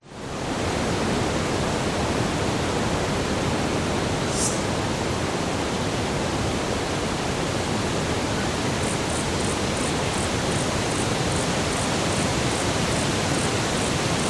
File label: forest ambience strong wind tree tops insects spangled drongo bird call; nature; towards end; zapsplat